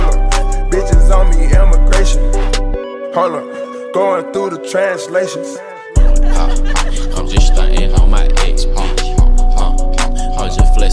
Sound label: new hip hop ringtone